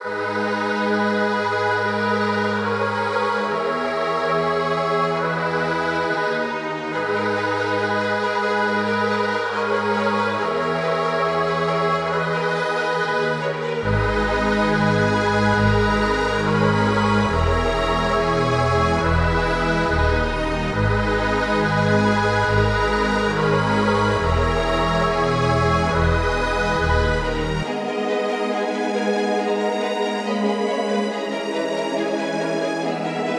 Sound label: 139 BPM G# Min, Melody, Drill; Cymatics; 2022 Melody Collection